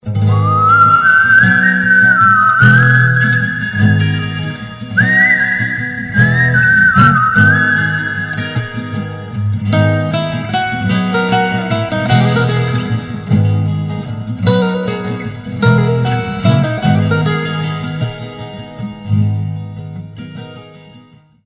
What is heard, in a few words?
Mobile ringtone